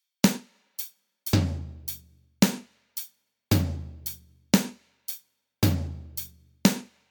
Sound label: Drums